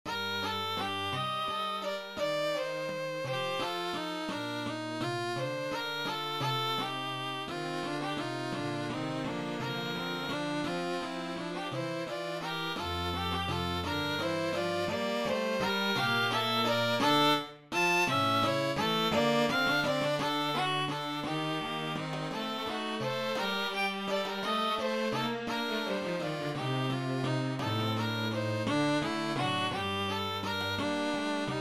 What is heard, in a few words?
bongo drums